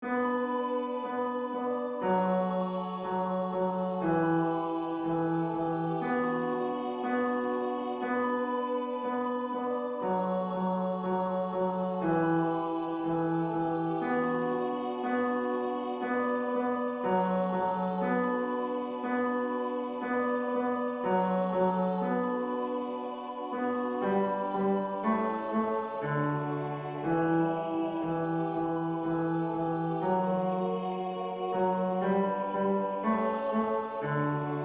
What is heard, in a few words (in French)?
basse tutti